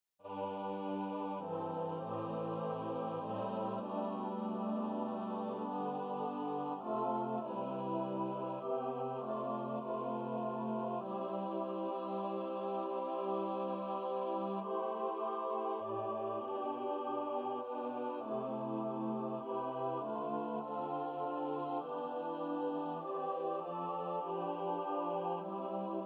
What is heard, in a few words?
choir